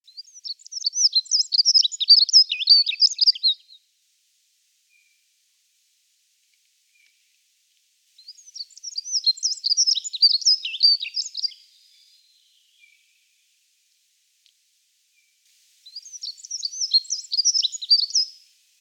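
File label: dunnock voice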